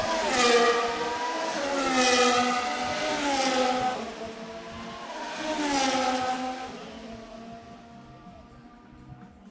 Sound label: Live Formula 1 Racing